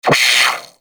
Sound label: Sentient Brachiolyst Pain; Warframe